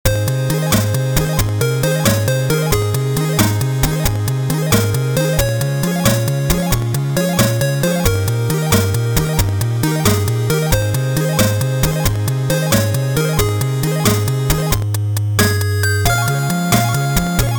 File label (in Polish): Barka, Chiptune